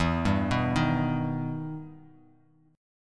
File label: E Minor Major 7th E down Bass Standard